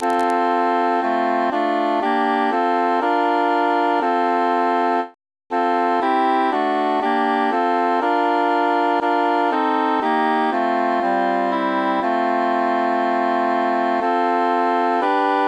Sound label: bassus mute; o occhi